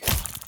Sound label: Death; Ion Shift